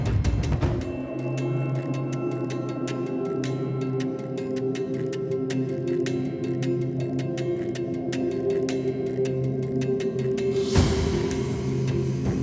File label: Music Combat, prison escape; Elium Prison Escape